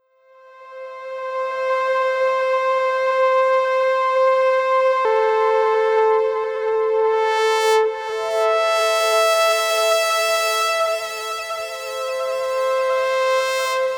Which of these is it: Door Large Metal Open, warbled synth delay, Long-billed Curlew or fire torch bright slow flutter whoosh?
warbled synth delay